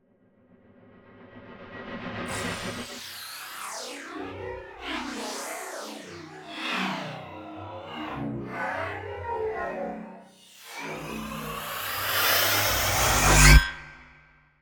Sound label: Storms Meteor; Gothic Storm Music; Advanced Sound Design